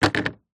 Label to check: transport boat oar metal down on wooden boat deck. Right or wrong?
Right